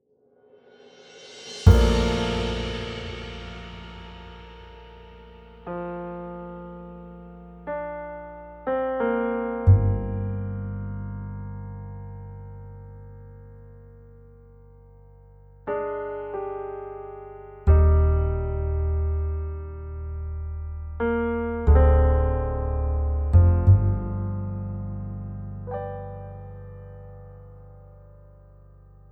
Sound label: Jazz Solo Game Over